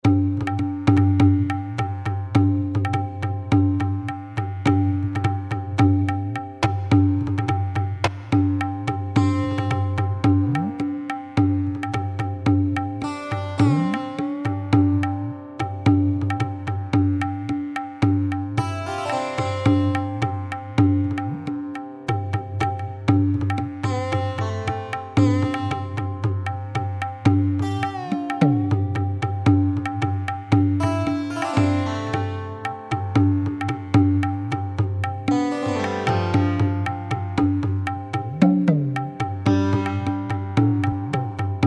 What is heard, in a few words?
808 India Jam